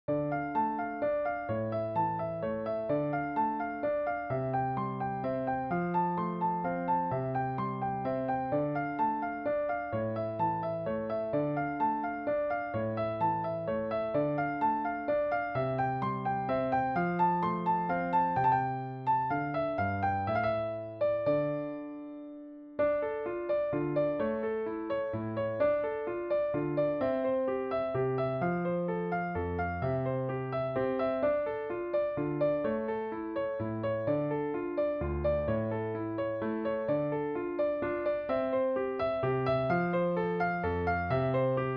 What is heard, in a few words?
Spanish Folies, Piano Keyboard; a scarlatti